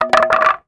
Wood 2x4; Tools; 1001 Sound Effects

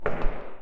footsteps hallway; Apotheorasis

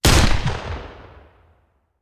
Hand Gun; Sound Ideas; Guns

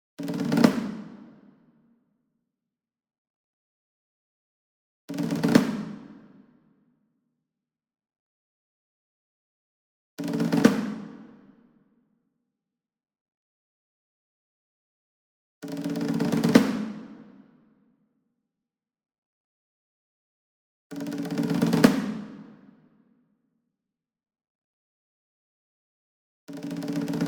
SINGLE DRUM Shime Daiko Roll Medium; boom library; Cinematic Strikes Construction Kit

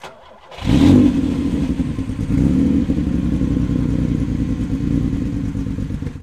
Ferrari Dino Start Off, Automobiles

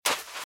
Footsteps Sand Dry Run Left; RadwinIsland

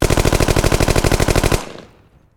Gun, Machine, Sub Machine, Long Burst; gun and weapon sounds; captain audio; fully loaded

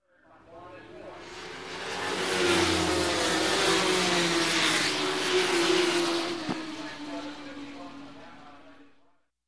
Motorcycle, Race Production Bikes Pass By; announcer; Sound Ideas; Series 2000

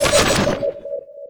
Pickup Scifi; SFX Kit

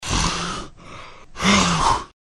Sleepy Monster; blindside

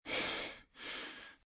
RadwinIsland

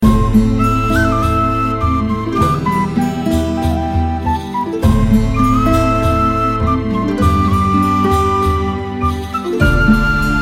Music Fiddle Loop

